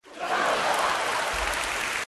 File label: Crowd roar; ZTE F451